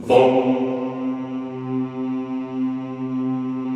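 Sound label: Vohmm Houuu, Chorus; Sound & Vision; Gigapack I CD 2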